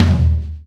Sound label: Drum Tom Low; the princess and the frog; Mansion; Twitch Drums